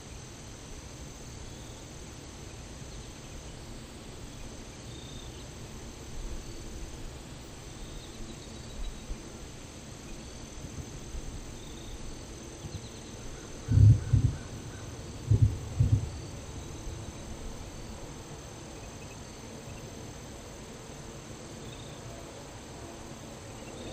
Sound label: Anomalous Environmental Sounds